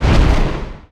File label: Flare Gun Hit